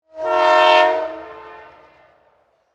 Train Horn Blow, Transportation, Train, Horn, Blow, Distant; Trains; Vehicles; BlastWave